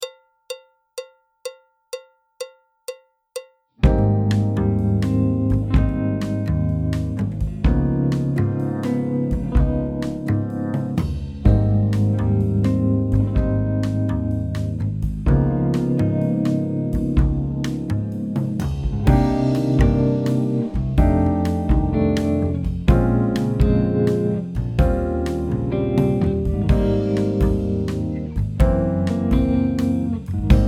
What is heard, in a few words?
tenor, trumpet; Jazz Syllabus Level 4